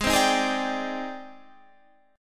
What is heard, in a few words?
Ab Major Sharp 11th Ab down Guitar Standard; AbM#11 strummed; JGuitar